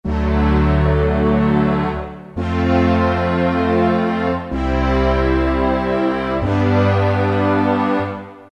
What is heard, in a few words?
Strings Horns, Roland PN-D50-03